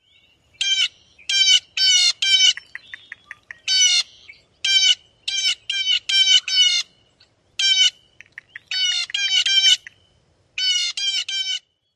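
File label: BLUE JAY jay calls amp clicks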